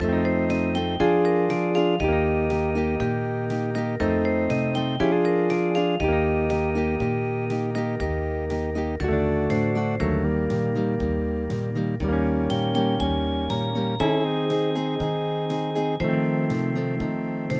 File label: hawaiian